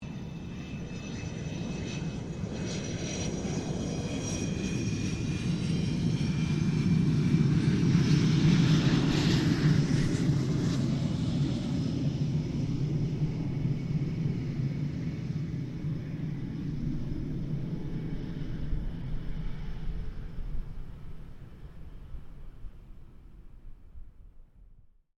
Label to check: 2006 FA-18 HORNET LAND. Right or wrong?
Right